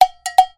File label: message, notifications; Coolpad; Fancy-3